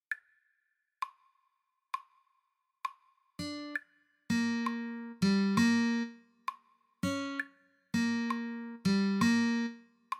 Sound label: slow, guitar